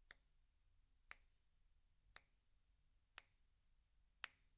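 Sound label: Snare Top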